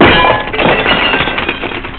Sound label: glass break